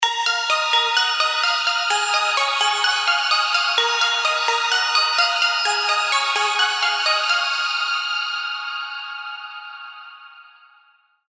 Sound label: Arps A# (128 BPM); MIDI ANTHEMS